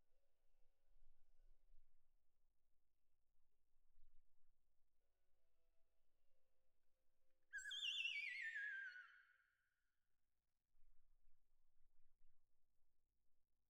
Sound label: Perc Main